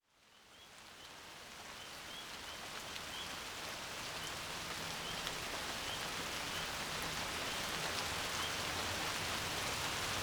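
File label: sounds of rain, peals of thunder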